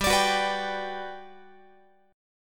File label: G Major 7th, G, down, Guitar; Listen to GM7 strummed; GM7 Chord